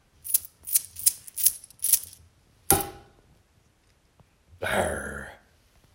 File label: pirate theme